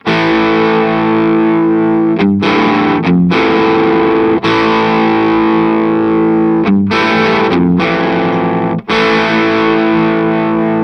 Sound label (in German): Boss DS 1w Custom Tone 12 Uhr Dist min